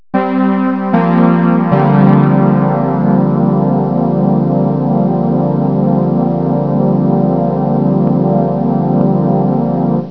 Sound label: chordus; Enciclopedia Del Perro